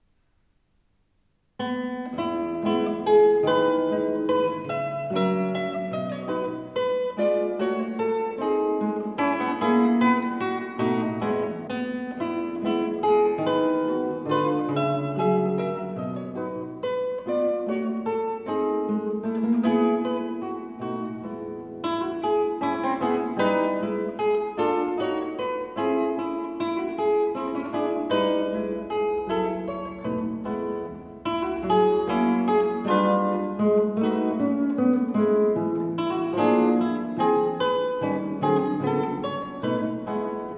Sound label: for guitar; La Filadora; Guitarra española; from Canciones populares catalanas